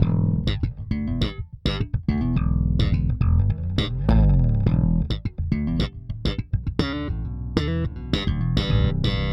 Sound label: Slap, Bass; Bass X